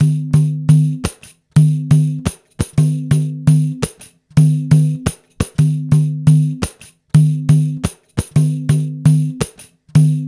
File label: pandeiro 86 BPM